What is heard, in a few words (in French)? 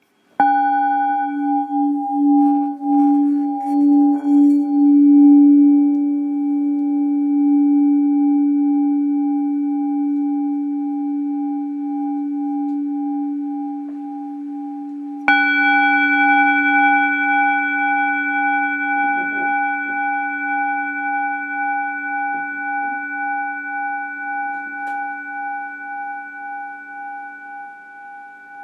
bol bengale Sol